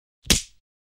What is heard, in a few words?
fights